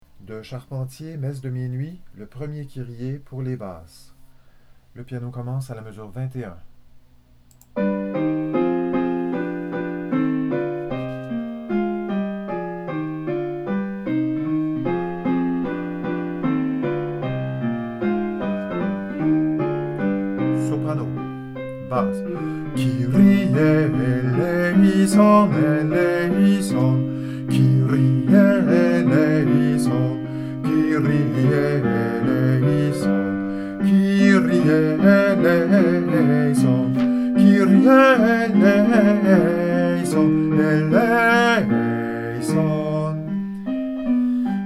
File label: messe kyrie; charp messe